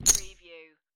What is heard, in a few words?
Poker Chips Three Chips Dropping On Felt